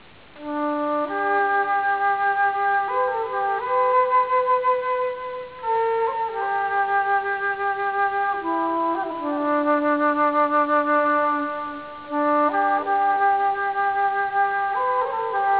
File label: amazing in at flute